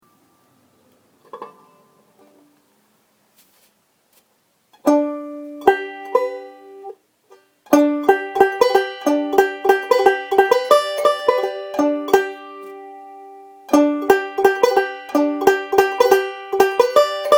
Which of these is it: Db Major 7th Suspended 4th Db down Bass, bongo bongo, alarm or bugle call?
bugle call